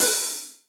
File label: Hihat Open Classic Muffle; Drum Hits; Ableton; Factory Packs; Session Drums Studio; MPE Hits